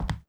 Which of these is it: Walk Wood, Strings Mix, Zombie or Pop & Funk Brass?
Walk Wood